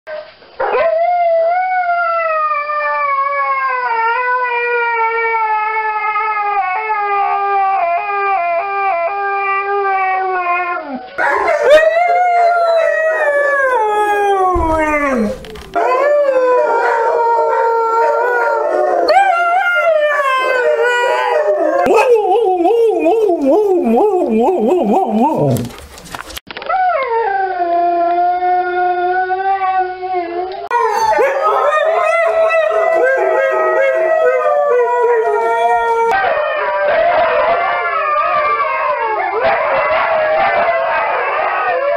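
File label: Husky, howl; زوزه هاسکی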